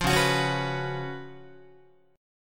Db 11th, Guitar; strummed; JGuitar

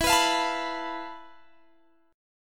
F Major 7th Flat 5th E, guitar chord

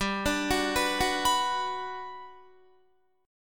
G Major 7th G down Guitar; GM7 arpeggiated; 4E,4A,5D,5G,5C,6F

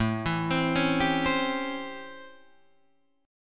A 7th Sharp 9th, Guitar, Open D

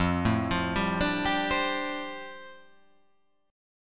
F 6th Add 9th F down, chord

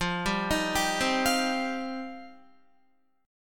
F 7th Suspended 2nd, Guitar; F7sus2; arpeggiated; 13,10,13,12,12,12

